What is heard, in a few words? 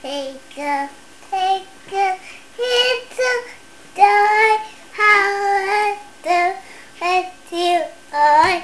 twinkle